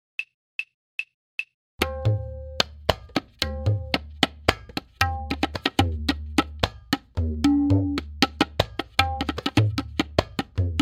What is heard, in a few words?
Tipalli Gat, tabla; Layakari in Practice and Performance